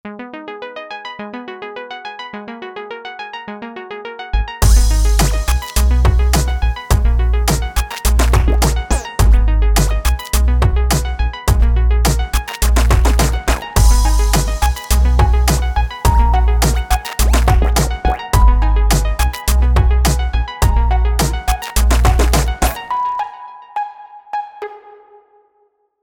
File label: ditty